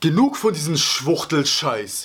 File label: hurt; spackenmobs; apored